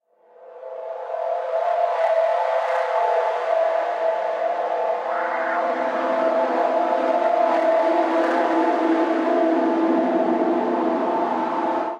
Drone F 80 Bpm; MIDI Clips; Instruments; Pioneer; Ableton; Drone Lab

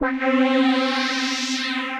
Orca Lead, Synth Lead; Ableton; Creative Extensions; Racks

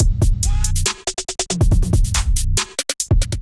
Castle Kit Groove 140 bpm; MIDI Clips; Ableton; Factory Packs; Beat Tools

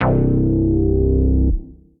Bass; Retromancer Bass; Vibrations by ModeAudio

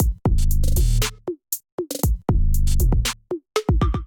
Abyss Kit groove 59 bpm; MIDI Clips; Ableton; Factory Packs; Beat Tools

